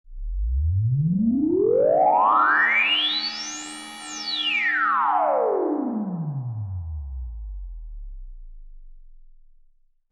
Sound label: Sci-fi alien warp granular tone